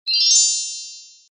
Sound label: multimedia notification chime bell; zapsplat